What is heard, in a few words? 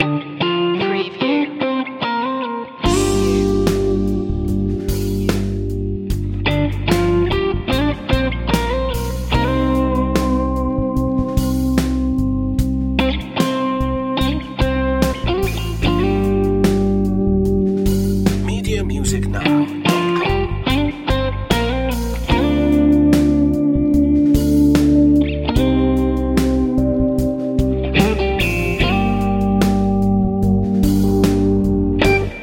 strings and melodies loop